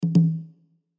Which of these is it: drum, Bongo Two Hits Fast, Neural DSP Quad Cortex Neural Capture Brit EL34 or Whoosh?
Bongo Two Hits Fast